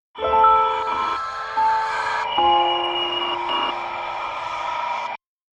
Scifi Audio Logo Ident Post Apocalyptic Nostalgic Transmitted; Science Fiction Sound Effects